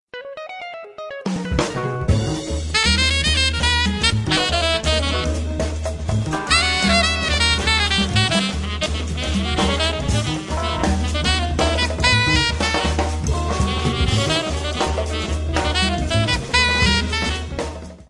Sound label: Jazz Bar; Samsung SCH-R100 Stunt MetroPCS